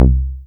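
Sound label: Moog Rouge, Bass; Best Service Gigapack 2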